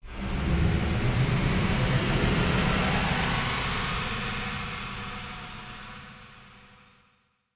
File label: warp; LcdDem SFX